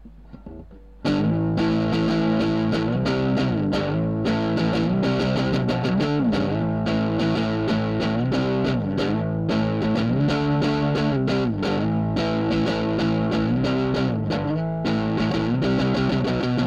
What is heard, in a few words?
Guitar